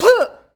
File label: Boxing Female Spit Mouthguard